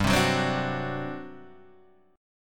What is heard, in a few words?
G Major 7th Suspended 4th, G; GM7sus4 Chord {3 3 4 5 3 2} strummed